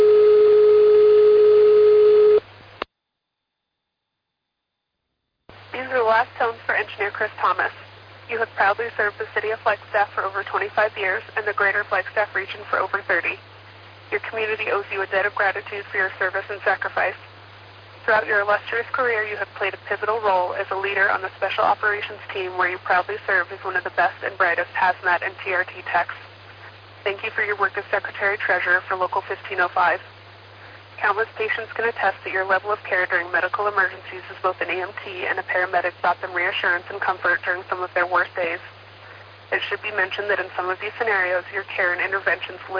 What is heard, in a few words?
Battalion Alert